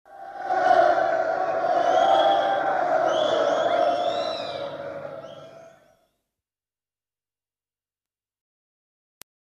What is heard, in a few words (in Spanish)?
gente gritando